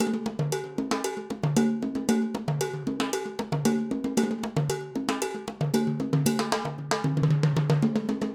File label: Acoustic Percussion